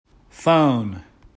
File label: phone